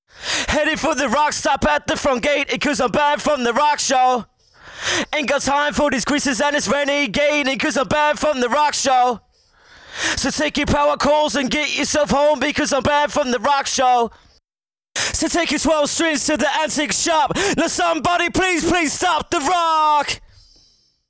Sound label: lead vocal processed